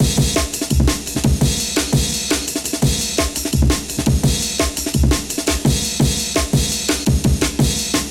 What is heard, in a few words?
Amen Break